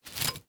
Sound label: Surgical Tool Pick; Medical; Blastwave